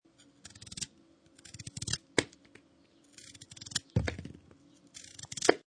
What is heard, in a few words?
Kitchen Knife Slicing Carrot Slowly; Food Prep; Restaurants; Lynne Music